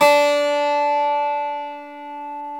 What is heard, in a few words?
GTR 12, Ac 12+ E.12